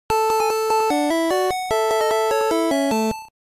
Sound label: Fanfare Victory; Tetris (Game Boy)